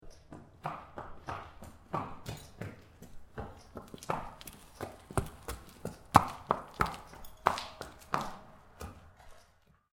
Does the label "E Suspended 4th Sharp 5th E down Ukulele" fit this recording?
No